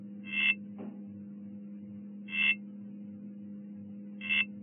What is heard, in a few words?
Xenopus gilli